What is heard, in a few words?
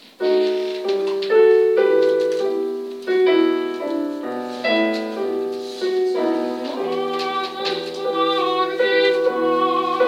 piano; son nata isabella